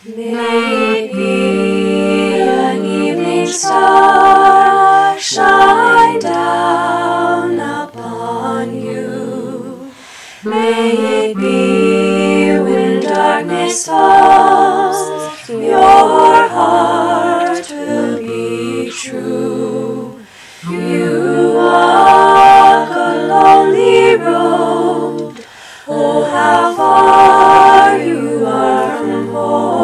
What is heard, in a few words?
Vocal 4 Parts